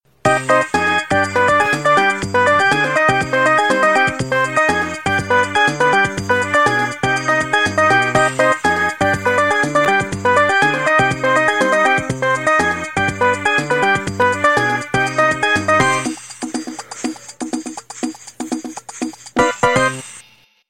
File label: Latin Loops